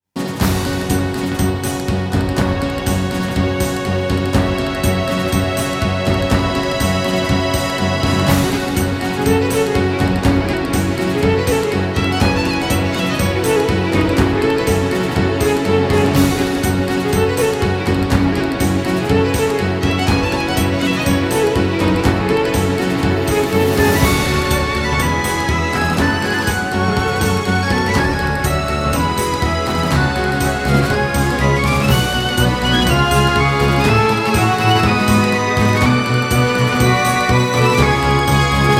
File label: Drum Arts; Summon Night 4